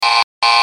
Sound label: buzz buzz